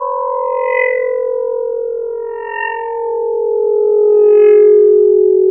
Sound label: harmonics; Scars FNC SFX